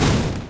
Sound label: range projectile impact; weapons; dota; creep